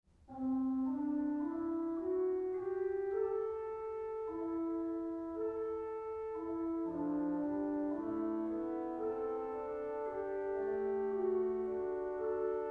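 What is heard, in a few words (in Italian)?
canoni, organist; Canoni RPS (2017); 1) Canone primo RPS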